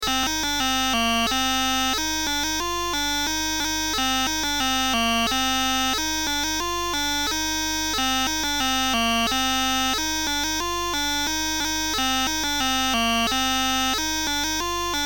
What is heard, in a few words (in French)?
suite Hanter Dro